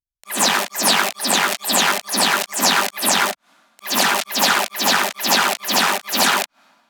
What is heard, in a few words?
heavy liquid bass texture